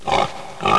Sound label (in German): grunzen